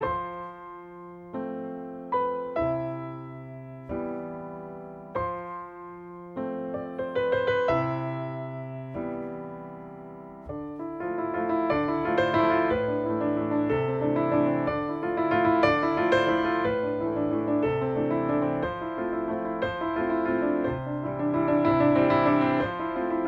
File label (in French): La valse (piano)